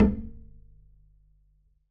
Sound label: perc, pizz; meatbass